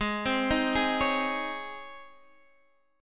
Db Major 7th Suspended 2nd Ab

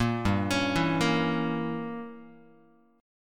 G Diminished 7th Guitar, Gdim7 chord; arpeggiated; JGuitar

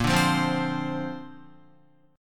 A sharp Major Add 9th, Guitar; strummed

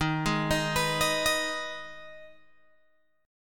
Eb Suspended 4th Sharp 5th, Guitar, Open G

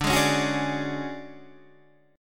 D Major 7th Flat 5th D down Guitar DADGAD

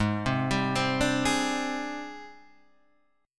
Ab Major Sharp 11th Ab, Guitar DADGAD; down

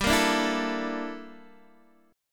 G Minor 6th Add 9th G down Guitar Open D

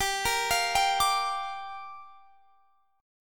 G Minor 7th

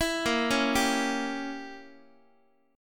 Bb Diminished 7th, Guitar; x,x,7,8,5,6; arpeggiated; 4A,4E,5A,5D,5G#,6C#; chord shape

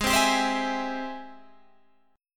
G sharp Augmented Major 7th G sharp Guitar Open G

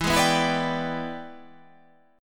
E 7th Sharp 9th

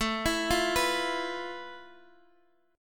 F Major Sharp 11th A

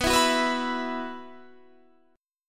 B Suspended 4th, Guitar, Standard; 19,19,16,16,17,19; strummed; JGuitar

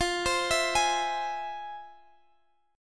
F Minor Major 7th, Guitar, Standard; arpeggiated; capo 2; x,x,15,17,17,16

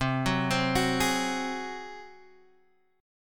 Gb Suspended 2nd Flat 5th C

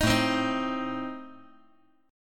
A Diminished A down

